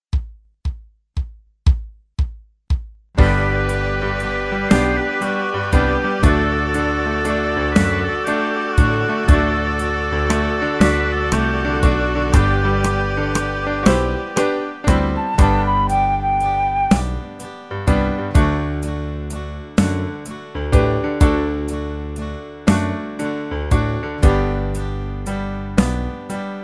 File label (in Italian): base senza melodia